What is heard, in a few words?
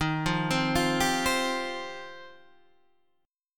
F 7th Suspended 2nd